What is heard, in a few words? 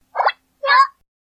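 Wii Remote Sound; Ice Climbers; Wiimote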